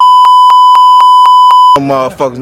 death; freak fortress 2; gangsta spy

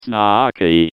pain severe; pyro; johnmadden

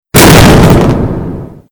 fireworks spark; tf

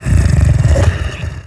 alien idle; xenomorphs

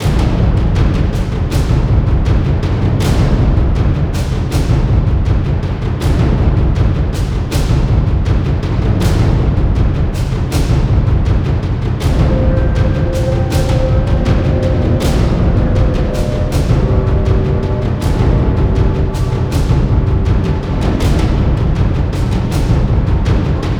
wretch chase; A Machine for Pigs

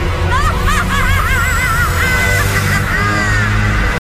laugh; mlp

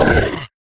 kranagull scream; kraak

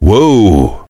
heavy pain severe; tf; duke

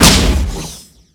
fire, predator

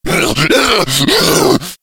idle, slender shiver

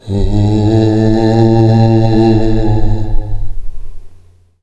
halloween moan

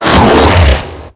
baron pain; doom4

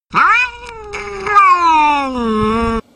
cat

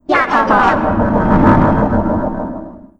stun; slender; isabelle

